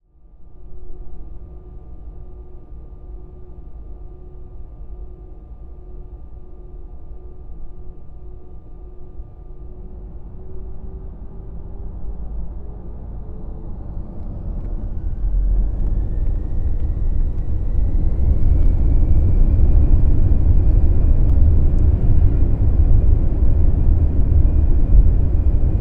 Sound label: Airbus A319 taking off